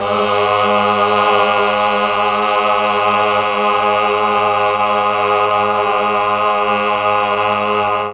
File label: G#1 8VOICE CH, Mellotron; 8VOICE CHOIR; Mike Pinder - Mellotron Archive CD